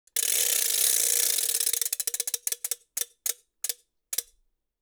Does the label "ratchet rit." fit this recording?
Yes